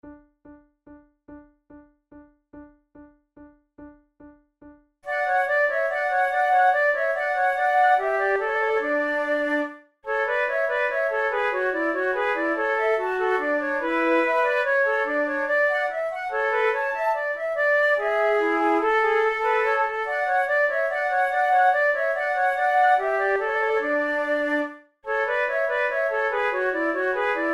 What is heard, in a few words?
flute trio menuett; quantz